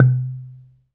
LOG DRUM; AKAI S-Series CD-ROM Sound Library VOL-5

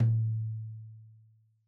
Tom2 Stereo, Deeply Sampled Drums

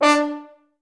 FH STAC D3; Best Service; Peter Siedlaczek's Advanced Orchestra

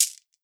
MIXED PERC; 90 s Sample CDs

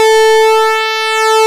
PULSEMOD, ARP Synths

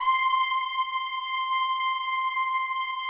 PAD, DIGI-PAD

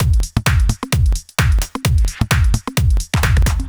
130 Hard Mean, Drum Loops 130bpm; Zero-G; Soundclash Nu Dance Electro; Acidized Wavs

